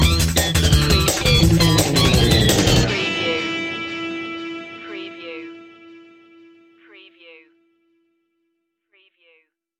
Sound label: surf loop ending